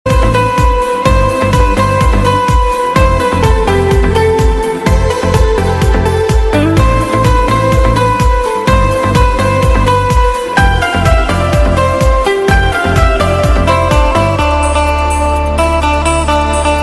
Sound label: new jazz